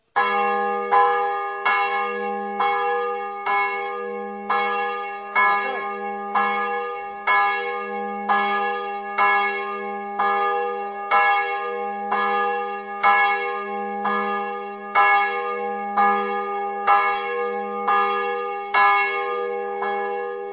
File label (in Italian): savogna, campane